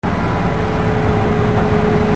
Running Engine, machines

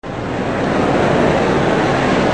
Crowd Noise

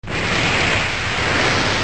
Pop Fizz

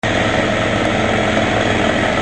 Construction; Tools

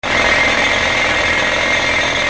Air Hammer; Tools

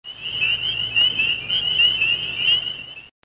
Peepers; Animals

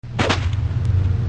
Whip Cracks

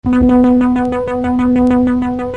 Electric Sound; Music

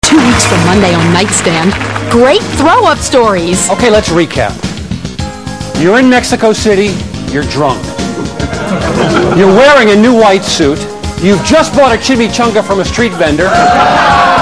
throw up; NightStand

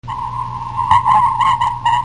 Sonar Pings

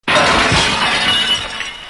glass

